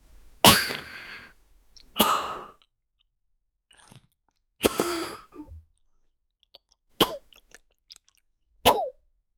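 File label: crunching chewing crispy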